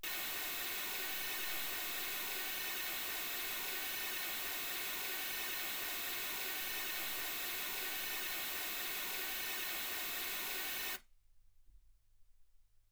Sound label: mls16 48kHz